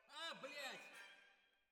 Twin F Timp

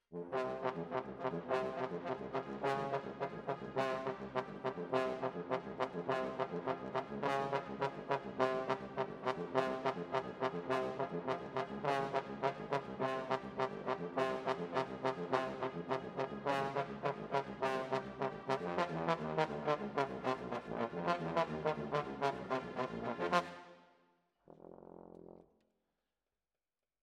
Trombones, jazz for piano

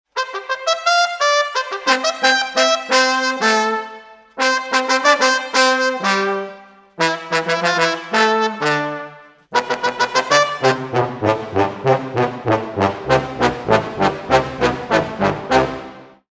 Brass, Jazz for piano

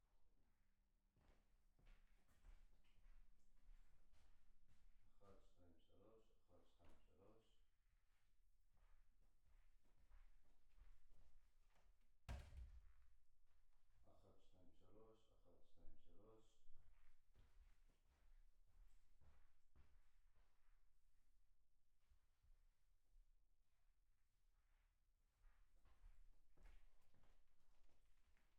PERC OH

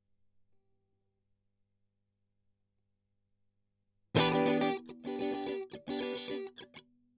Strum

